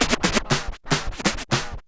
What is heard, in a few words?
SCRATCHES